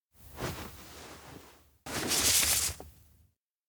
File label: bag takeout letter; anemoi